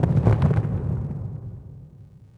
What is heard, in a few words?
distant explo